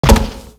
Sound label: Step Metal, Footsteps; Warframe; Grineer